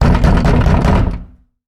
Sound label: beating on wooden door